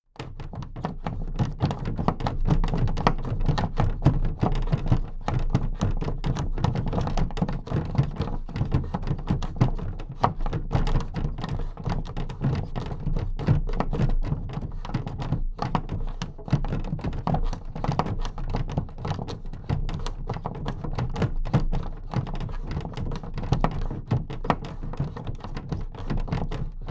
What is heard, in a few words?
big plastic case movement handle fast rumble